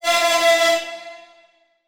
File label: Transition Feedback Electronic; Production Element